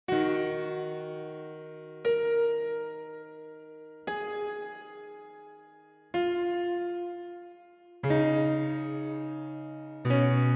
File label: Pensive Piano; Soundtrack